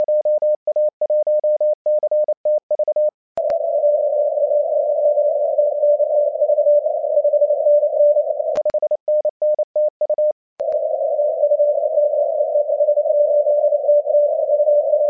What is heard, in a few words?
7mhz; dxcc